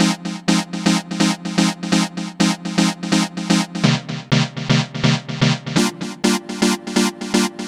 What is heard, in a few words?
SYN 125, House synth loops